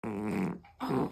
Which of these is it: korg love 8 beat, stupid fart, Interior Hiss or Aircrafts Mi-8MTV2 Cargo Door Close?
stupid fart